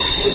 subway sound; Subway Sound Construction Kit

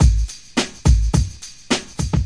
105 bpm, triple dum dum tambourine shuffle

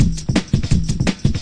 168 swinging pitcher, drum loop; 161 to 180 bpm

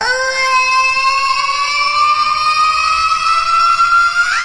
drawn out lady scream; VOCALS and SPOKEN WORD

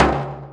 arabic perc, Arabic Percussion Kit

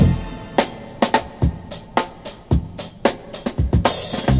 109 bpm super smash; drum loops and breaks

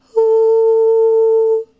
fem soft hoo, FEMALE SINGING; VOCALS and SPOKEN WORD

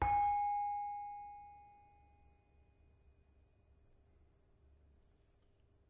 Saron, Indonesian Saron; Multisampled; WORLD

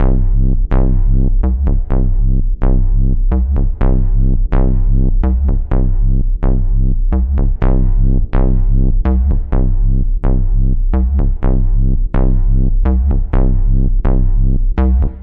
126 permabass whupple wobble; SYNTH AND ELECTRONIC LOOPS

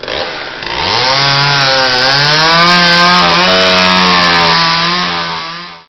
Chainsaw, Cheesy Lo-Fi Sound Effects

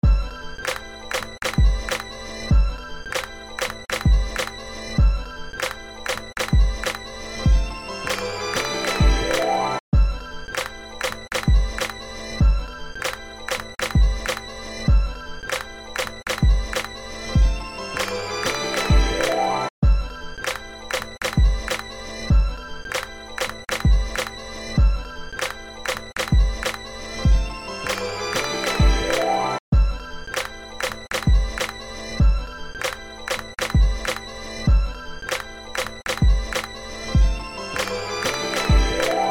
Instrumental

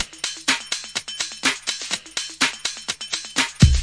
plucky garage beat min, drum loops and breaks

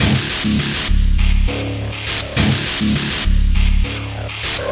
guitar space cuts; SYNTH AND ELECTRONIC LOOPS

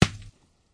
snowball wood, throwing snowballs; UNUSUAL KITS and FX

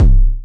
Syn Dr, Overloaded Synthetic Drums; Hip Hop; style kits

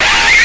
majorgrunge; Weird and Interesting Hits

